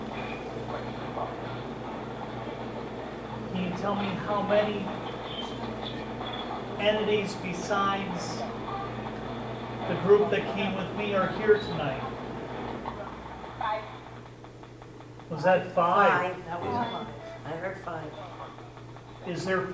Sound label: Ghost Box